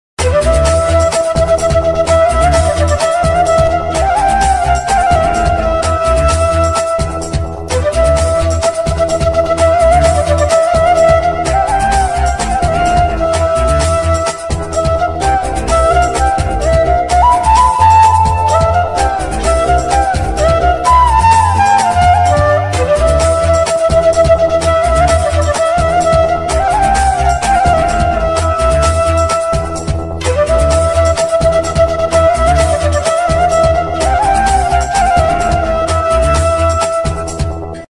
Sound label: bgm ringtone tamil bgm ringtone; sathya movie